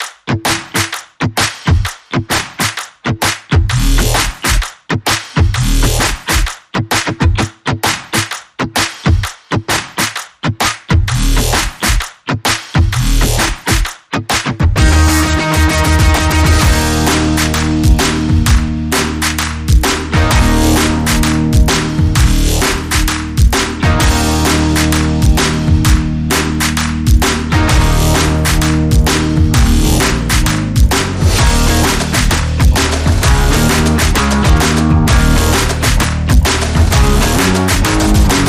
No Lead No Brass

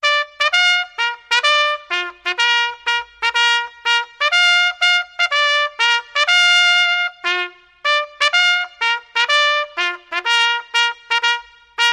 Solo Bugle Call Reverb

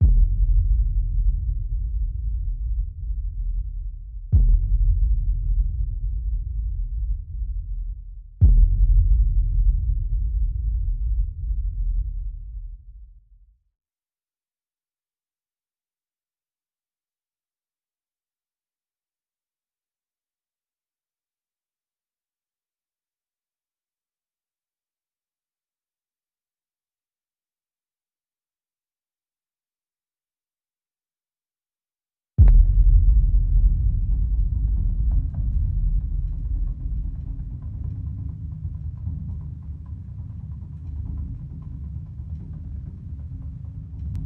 Bass Drum

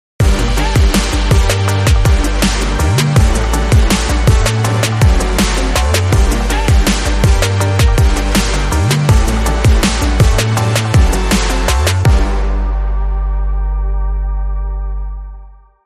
Brass Kicker

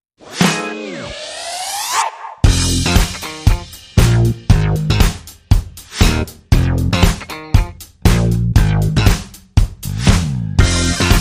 No Brass No Scatt Bass

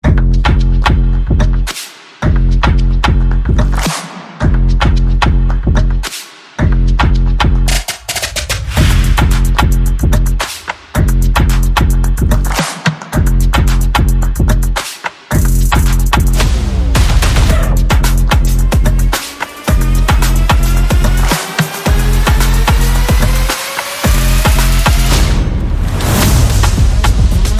Percussion Bass and Effects

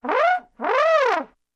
Trombone Wolf Whistle

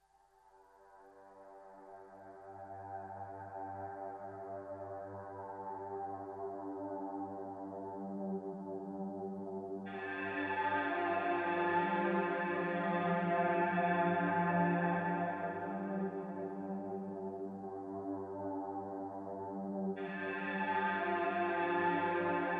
Main Drone

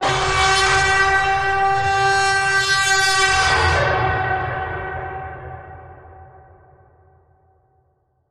Bend, F sharp

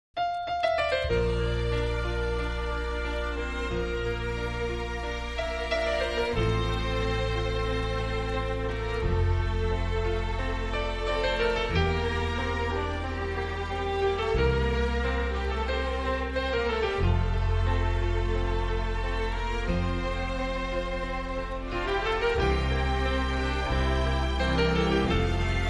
No Drums & Guitar

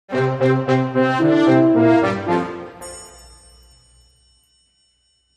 Brassy Jingle Bells

